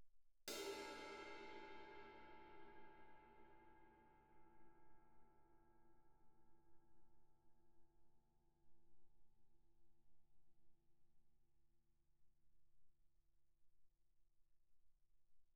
crash stick shoulder mf, suspended cymbals; Percussion

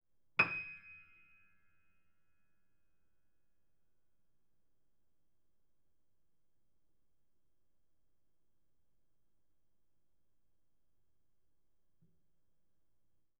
Piano ff Eb7

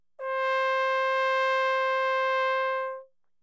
Tenor Trombone ff C5

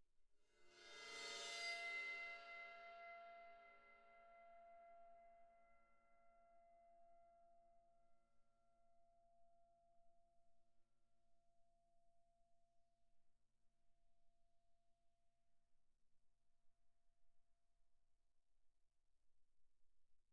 17 crash, bow, suspended cymbals bowed; Percussion

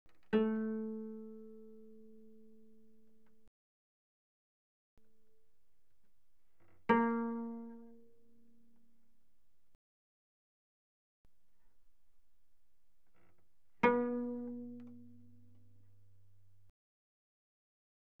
Cello pizz mf sul A A3 B3; Strings

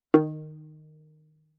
Viola pizz ff sul C Eb3; MIS Pitches - 2014; Strings

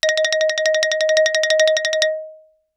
Xylophone rosewood roll ff Eb5 stereo; MIS Pitches - 2014; Percussion

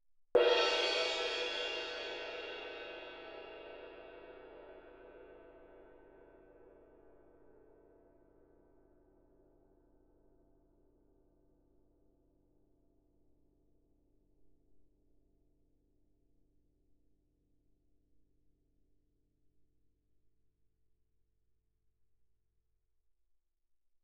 ride mallet ff, suspended cymbals; Percussion

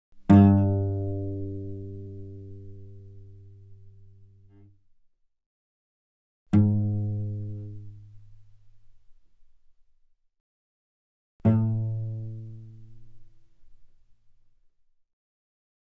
Cello pizz ff sul G G2 Gb3; mono; Strings

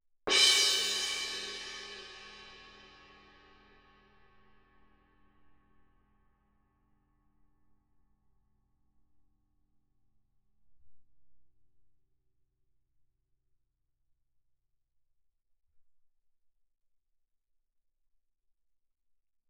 crash mallet ff, suspended cymbals; Percussion

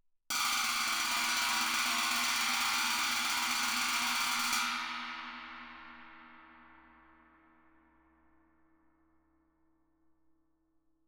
19 chinese, stick, roll, suspended cymbals; Percussion

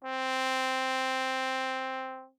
Tenor Trombone ff C4; Brass

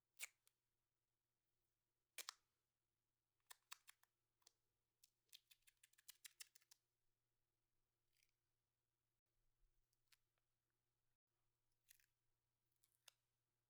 Grey Duck Tape; Soundmined

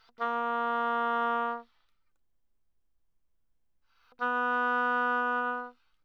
Oboe mf Bb3 B3, woodwinds

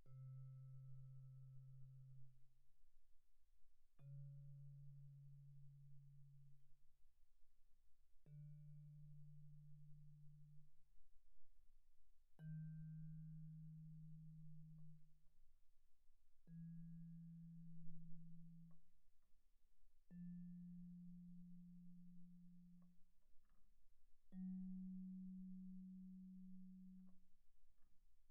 Vibraphone short sustain pp C3 B3; Percussion

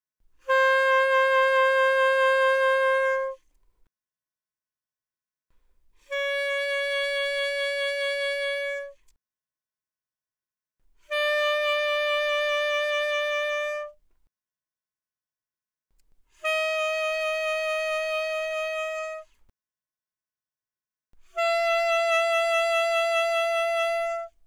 Alto Sax Vib mf C5 Ab5, alto saxophone

